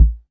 Roland RHY55, drum machine

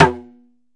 ROLAND SPD-20 PERC TABLA; ETHNIC and WORLD PERCUSSION

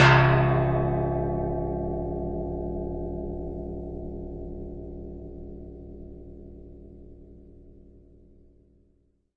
Long Gong Stick Hit; ETHNIC and WORLD PERCUSSION; Longevity Gong by Amani

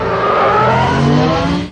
Electro FX; Ueb HE Electro FX

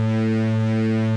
nuke; VIDEO GAMES; solarq

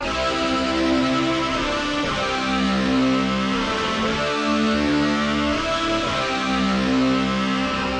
120 distorted choir melody; SYNTH AND ELECTRONIC LOOPS

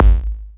crackly kick, Electro FX; UNUSUAL KITS and FX; Atomhard Electro FX

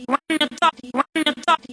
grunty vox, 140 bpm; stutter vox; REMIXABLE COLLECTIONS

